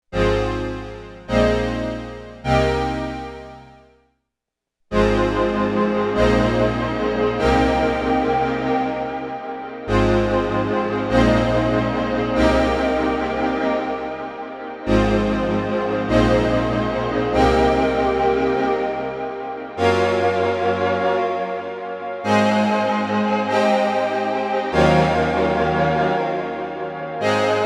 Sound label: magneto, bigsky, roland juno6